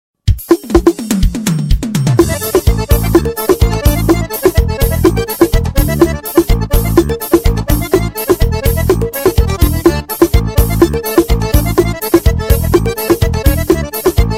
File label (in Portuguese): solo